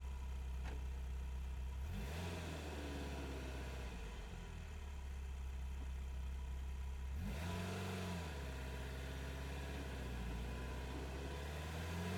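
Ferrari, 308GTB, Onboard, Drive, Gearshifts, Engine, Right; 1985; DPA4062; Pole Position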